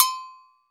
AGOGO BELL, Brazil; AKAI S6000 CD-ROM - Volume 5

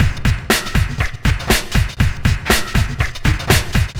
shufflin beat; Sonic Foundry (Sony Creative Software); Ugly Remnants VOL-2

